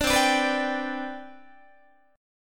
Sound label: C Major 7th Suspended 2nd C down Guitar, chord; CM7sus2 strummed; 17,20,17,21,18,18; JGuitar